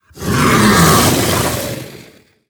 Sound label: Volcanic Drake Death